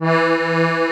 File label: STEIRI E 2, STEIRISCHE